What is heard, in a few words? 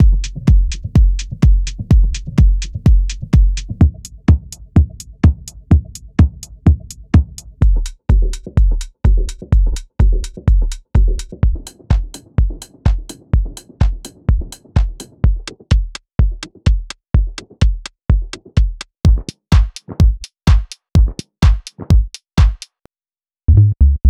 micro house